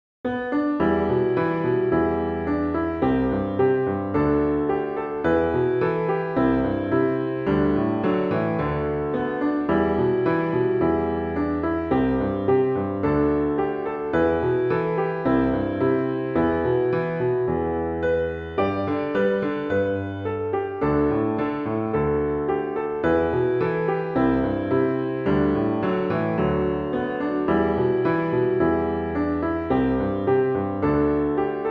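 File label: accompaniment clavier; année Schweitzer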